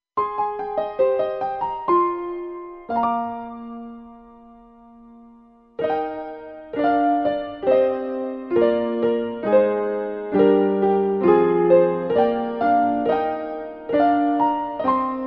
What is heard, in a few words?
carillon